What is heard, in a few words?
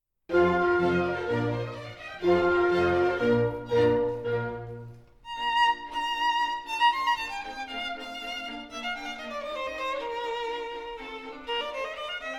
Violin Con Bf